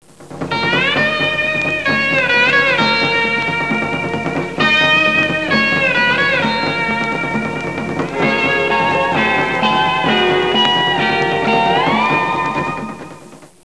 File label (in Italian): musica strumentale